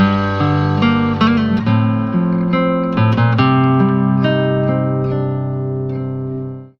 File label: guitar, Loops